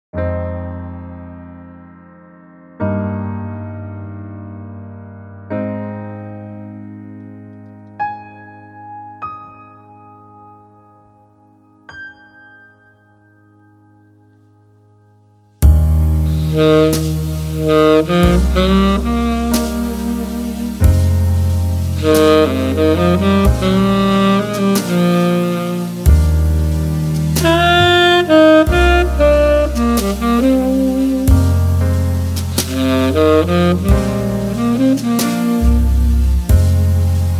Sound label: instrumental